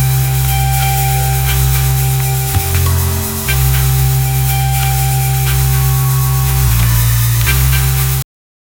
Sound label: car chase music